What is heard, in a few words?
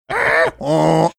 making donkey braying sound